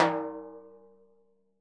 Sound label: TIMBHI RIM R, Synclavier Percussion; ILIO; Synclavier Percussion 2; World & Orchestral